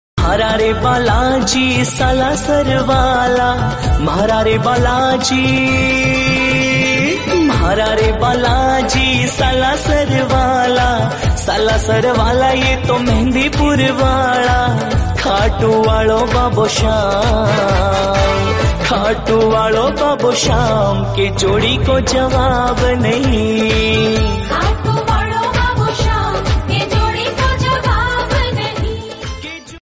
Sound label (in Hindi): hindi bhajan; balaji salasar wala